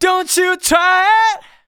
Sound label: vocals sung F#; Techno Trance Essentials